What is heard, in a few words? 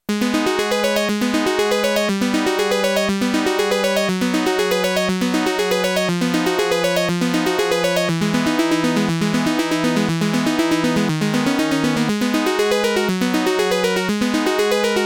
dexed ARP 2600 synth arp stay loop